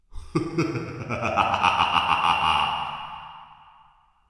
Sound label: Male Evil Cackle Laughter